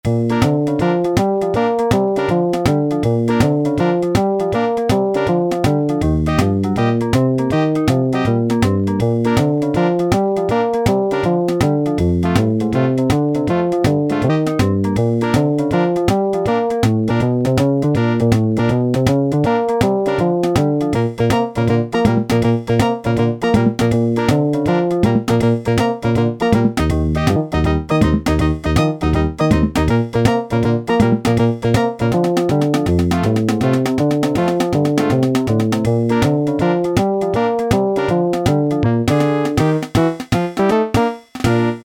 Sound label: BOOGIE-WOOGIE, Yamaha SHS-10; Factory style demos